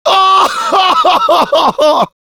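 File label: laugh happy, French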